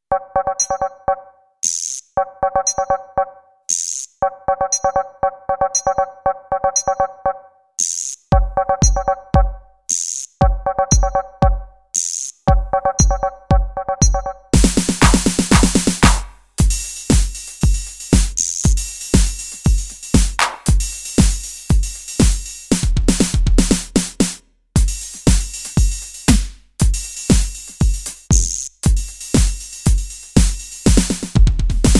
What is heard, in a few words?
techno